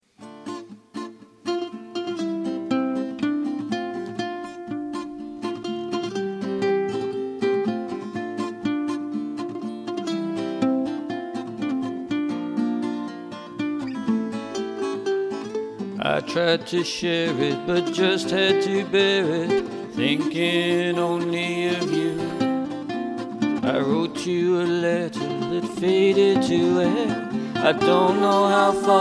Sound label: Country Songs